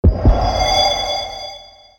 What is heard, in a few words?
Respawn Sound